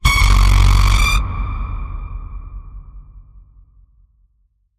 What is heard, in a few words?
Siren Sweep